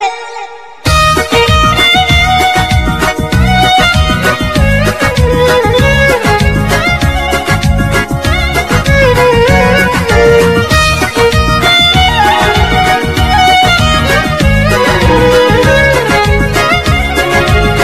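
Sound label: arabic violin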